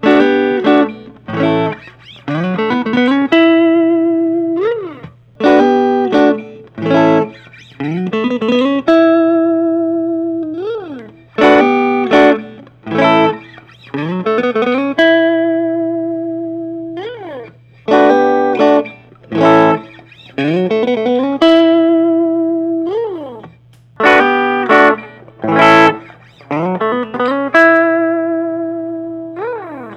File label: james tyler burning water